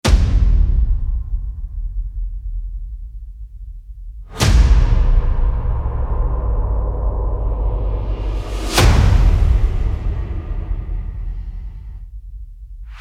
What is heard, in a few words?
percussion